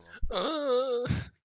moan

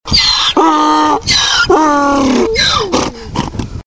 donky noise

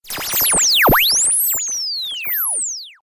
turning laser beam

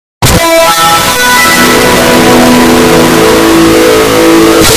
windows 7 but no earape

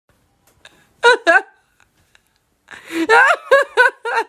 Weird laugh

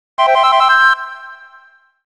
collect gold feather; banjo kazooie

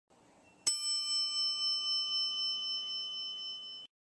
bell elevator

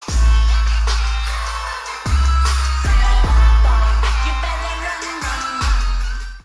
8d bass boosted concert effect

